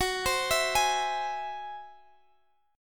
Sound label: Ab Augmented 7th Gb, guitar